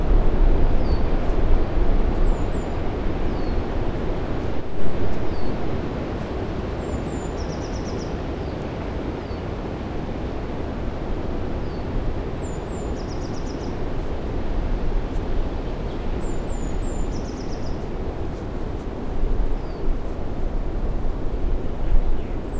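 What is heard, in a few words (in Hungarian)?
messze zugo szel menesviztarozo utan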